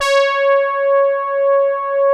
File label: poly hard C#5, soft brass; Music Store - Claudius Diemeter Schwerlos